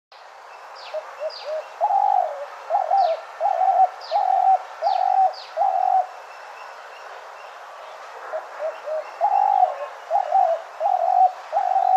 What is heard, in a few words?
Spotted Dove; Sri Lanka